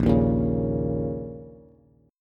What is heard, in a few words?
A sharp Minor Double Flat 5th, down, Bass; strummed; JGuitar